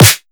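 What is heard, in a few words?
DNB DRUM one shot SNARE; KULTURE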